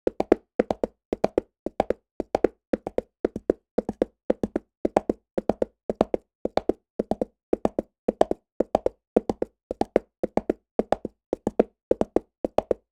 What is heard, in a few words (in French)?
Galop cheval sol dur